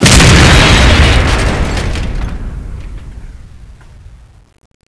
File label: explosion generator; zs rendezvous